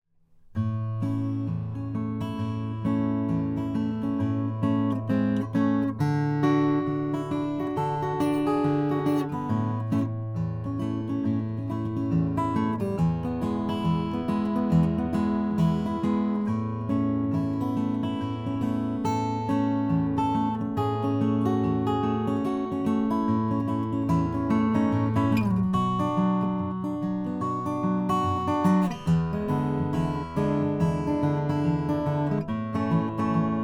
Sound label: froggy bottom h12 limited all koa